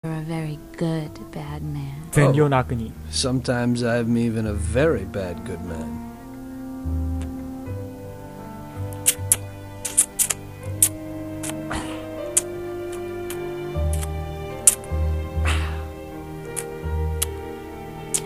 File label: fluter